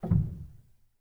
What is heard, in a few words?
rel, Grand Piano; bitKlavier Grand; Piano Bar